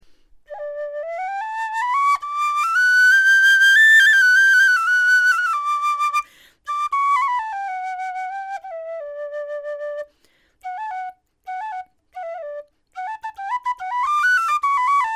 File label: tin whistle